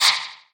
Chill Hit

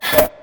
Reverse energy snare

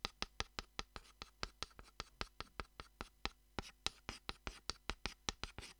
Chalk On Blackboard

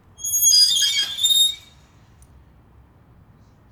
Metal Gate Closing

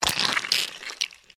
Splat Big Gooshy

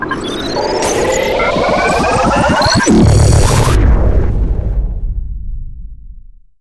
Radio Transition

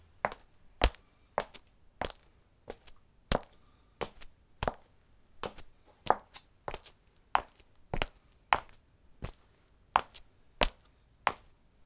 Footstep

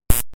Civilian Stun Gun Zap

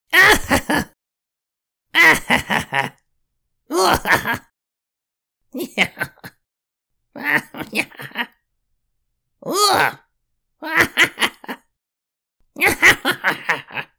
Goblin Various Laughs And Giggles

